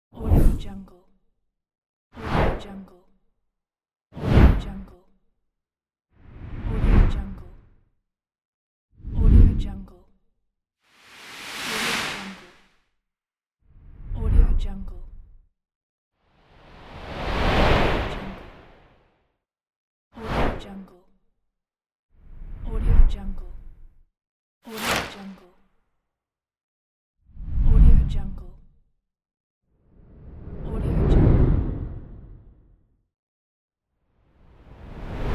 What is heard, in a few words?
woosh